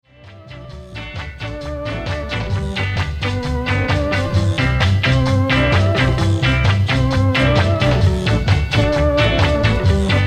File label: instrumental reggae